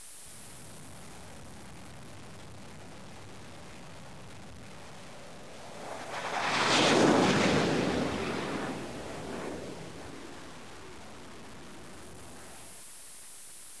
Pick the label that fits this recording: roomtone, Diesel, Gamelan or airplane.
airplane